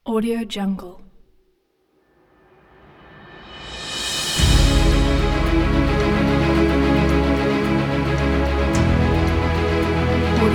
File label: epic kit; pattanostudio